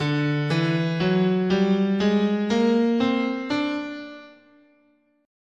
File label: D Major Locrian, scale